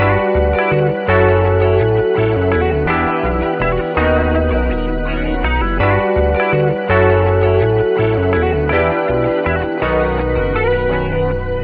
guitar, Loops, Phonky

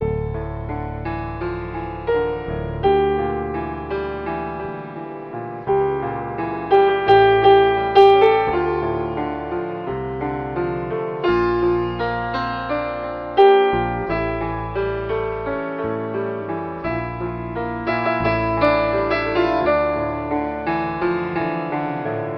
piano, sad loop

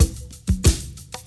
Boombap drum loop

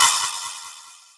guiro

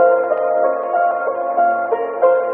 piano, Sad

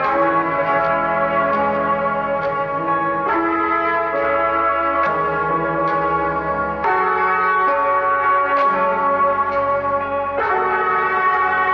horns, Sad; Loops

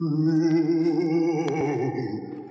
moan

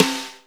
brush, snares real

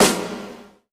snares real

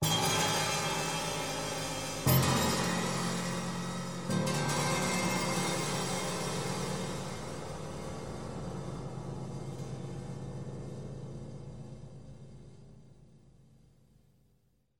guitar, Sad

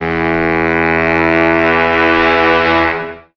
sax; Winds

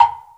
Pop, Misc Percussion

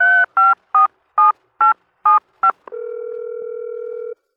phone dial

